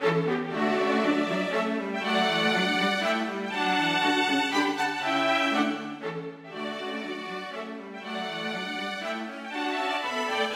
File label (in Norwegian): Menuet for strykekvartett